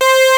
juno chorus high

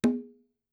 conga open

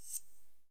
perc

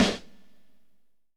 snare

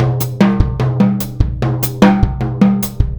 drum x2

